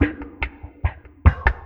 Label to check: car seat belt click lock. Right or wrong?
Wrong